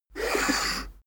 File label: poker chip slide table